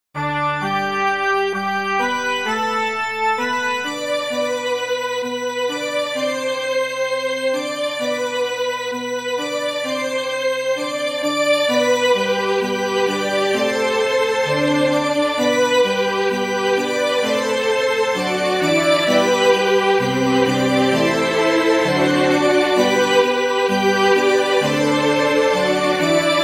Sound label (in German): orgel; Es ist dir gesagt, Mensch Micha 6, 8; Der Text nach Micha 6, 8 wurde zu einem Kanon vertont